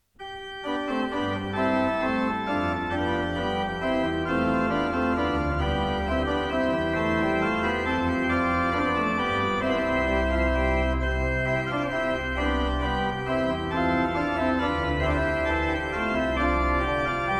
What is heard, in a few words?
organ, choral preludes; Komm, Gott Schöpfer, Heiliger Geist; Bach; Bach Organ Works